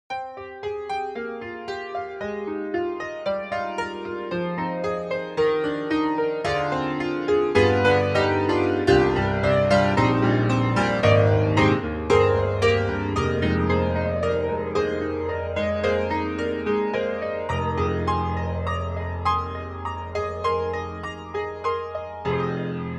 Imperial Grand, Flanger Grand; IK Multimedia; SampleTank3

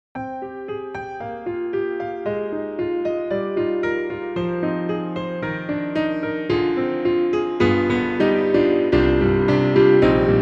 Grand Pianos German D Dreamy German Grand; Synthogy; IvoryII

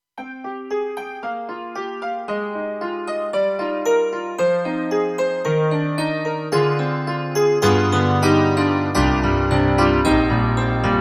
KORG PopBallad Piano2, TRITON-Rack; EXB-PCM08

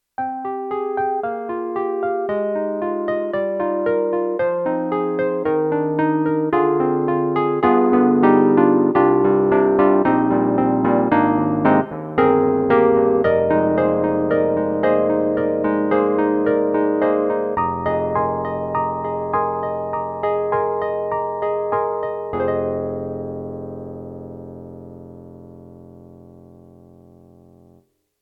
Yamaha MU1000EX Old EP

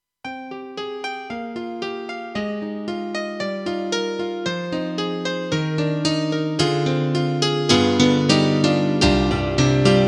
Korg TR-Rack M1 Piano

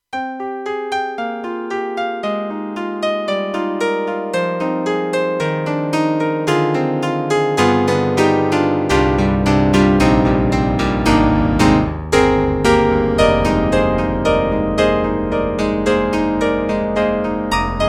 Piano; Roland; JV-2080; GM; Piano 3

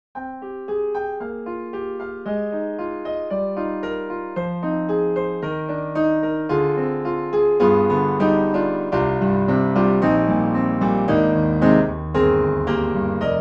Piano Room

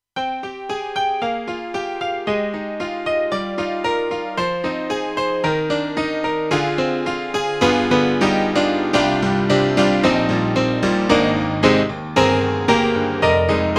Honky Tonk; KORG AG-10

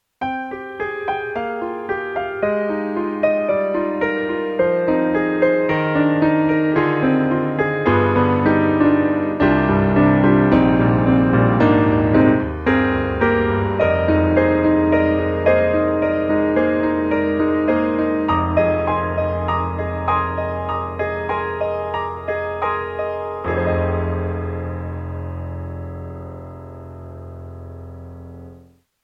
Giga Piano; Nemesys; GigaSampler; Library for GigaSampler

